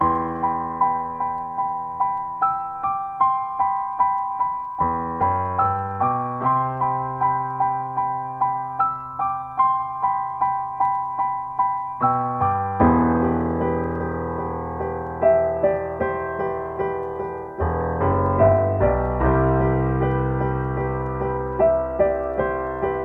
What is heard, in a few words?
75BPM E Minor Composition